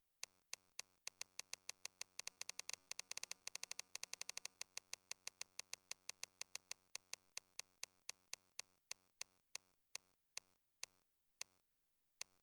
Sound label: carousel